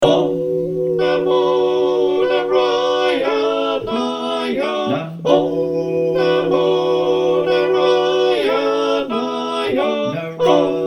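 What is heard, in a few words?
soprano alto tenor bass, choir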